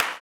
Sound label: CLAP, Blues Soul; Spectrasonics Vocal Planet